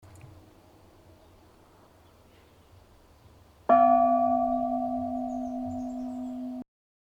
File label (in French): cloche; Valence, cloche 4bis